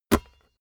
basketball catch hard

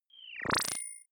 bubble swell ring, sweeps swells; comedy-cartoon; soundpool

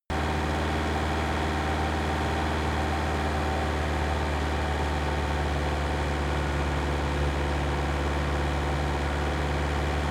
van engine steady 2000 rpm; verkehr transport; autos

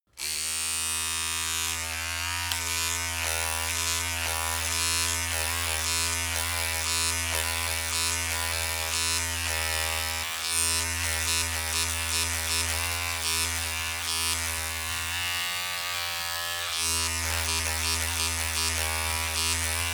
razor on load long

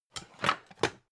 pan stack slide soft; objekte; topf

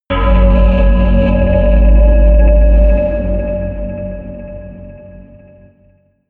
melodic anvil scrape low, drones

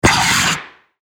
fx scratch; kratzen

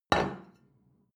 plate place table soft short, gastronomie; soundpool

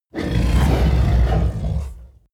coloss growl deep; kreaturen

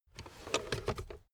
car backseat table pull up

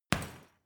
boxing punching bag hit medium; sport-freizeit; boxen

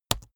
keyboard laptop enter press fast hard

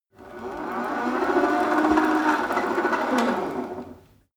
rope trainer pull sequence slow short; sport freizeit; fitnessstudio

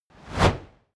fire torch bright flutter whoosh

